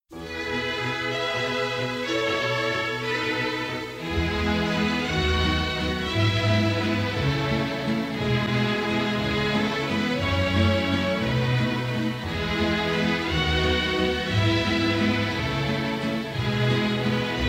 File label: Waltz